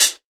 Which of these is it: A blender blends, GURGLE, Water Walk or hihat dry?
hihat dry